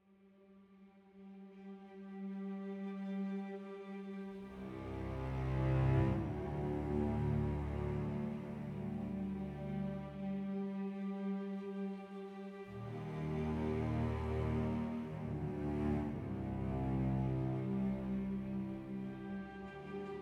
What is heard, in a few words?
ui attention urgent loop